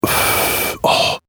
rauchen

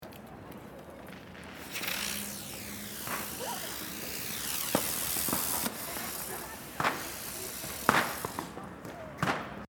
Silvester Feuerrad

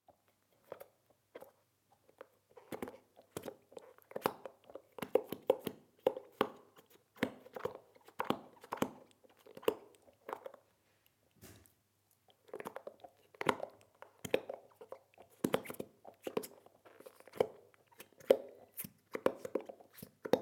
Hund nagt an Gummiball

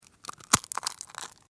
Eierschale zerdruecken

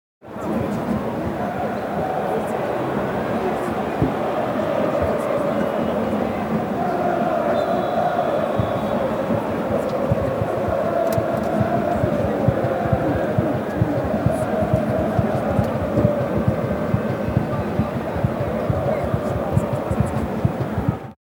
Stadion Atmosphaere

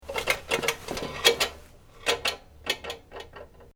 bad waage

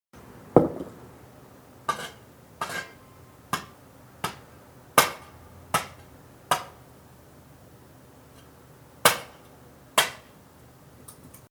Schaufel

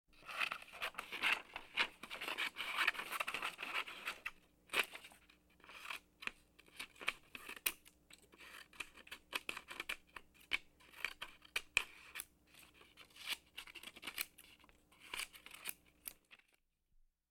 Im Buero Mit einer Schere Papier schneiden

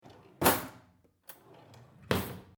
Schublade

